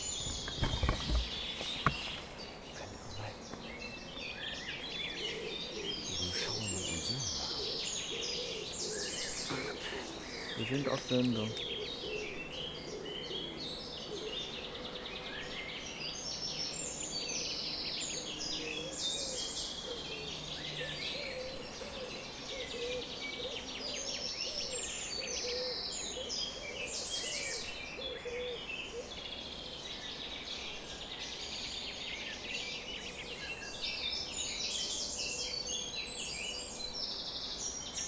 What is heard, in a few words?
summer morning bird chorus; Morning chorus of the birds, recorded at 4:30am on midsummer's eve, in a cobs (small clump of trees). The motorway is audible in the distanc